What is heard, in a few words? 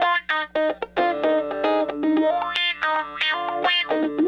Raw Funk Guitar; Sonic Foundry (Sony Creative Software); What it Is! 70s Analog Funk